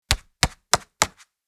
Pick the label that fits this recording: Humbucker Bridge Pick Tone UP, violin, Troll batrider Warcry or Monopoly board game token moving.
Monopoly board game token moving